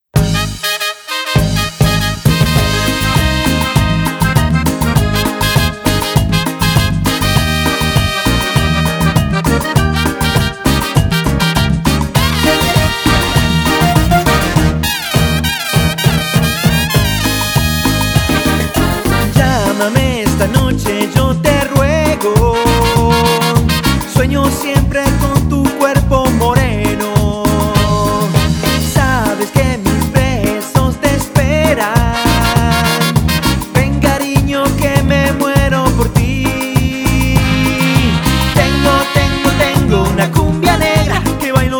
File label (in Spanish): Cumbia negra